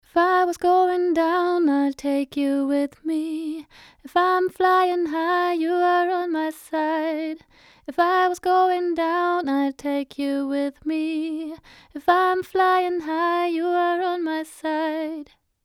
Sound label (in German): Leveler Vocal Medium 15 zu 1; Fredenstein Artistic